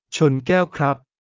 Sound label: cheers